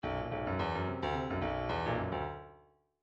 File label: midi grooves funk